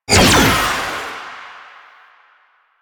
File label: Stalker Jade Scythe Proj Whizz; Warframe